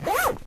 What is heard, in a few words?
Settings Window On Off; The Jackbox Party Pack 2 - RU